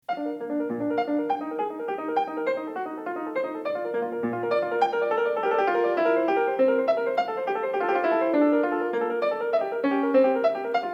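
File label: Giga, piano; Partita 1 in Bb; BWV825; Bach; Partitas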